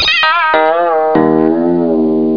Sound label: Koto Bend; musicmousedemo